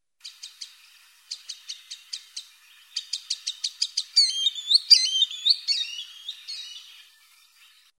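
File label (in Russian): chernysh golos, green sandpiper voice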